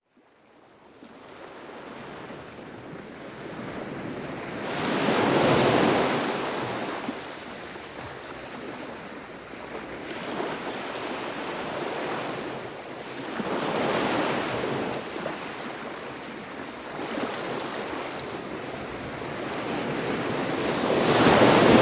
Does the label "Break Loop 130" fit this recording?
No